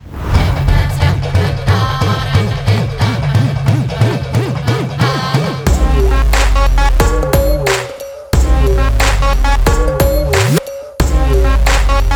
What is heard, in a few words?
(Instrumental); DSP (2022)